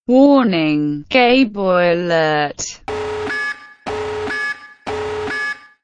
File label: toaster